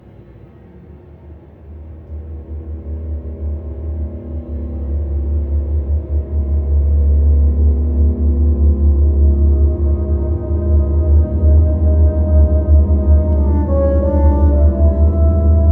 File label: Horror Loop